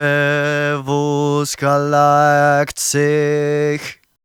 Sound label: Battle Cry, Viking Vocal, Un-Processed